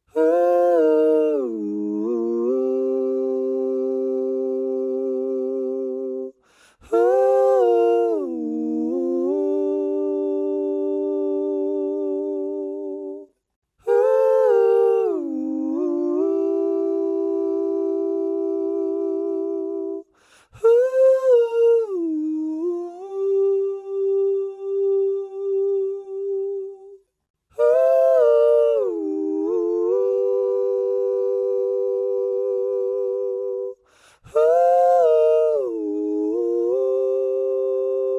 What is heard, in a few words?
ooh omni